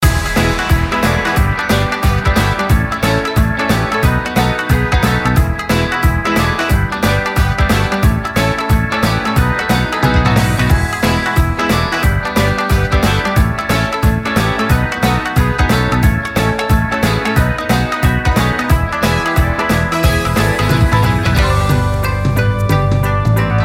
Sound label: Raid; Mist Train Girls